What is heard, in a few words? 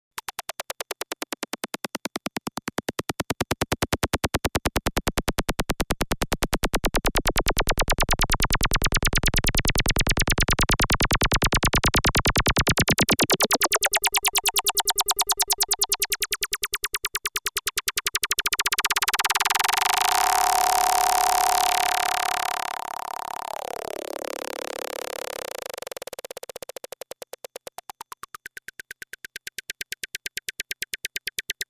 Filter Envelope Modulation